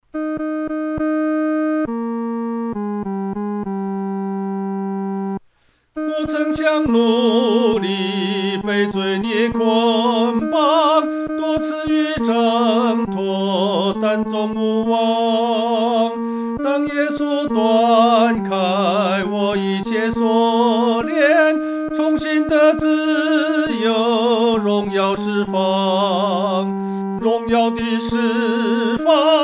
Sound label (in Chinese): 独唱 第三声